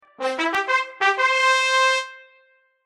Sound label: Fanfare